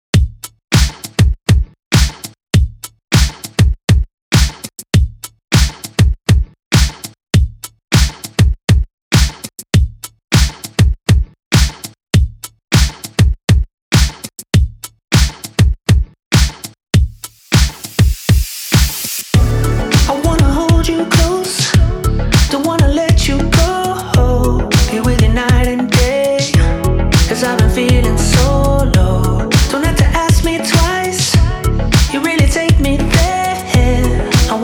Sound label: bpm 100; kwikmix